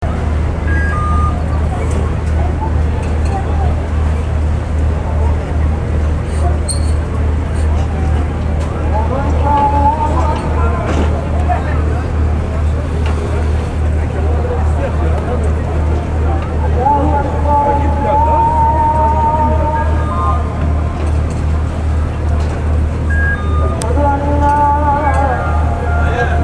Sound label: bosporus crossing evening soundscape